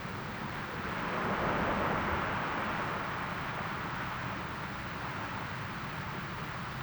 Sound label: Beach Dark, Water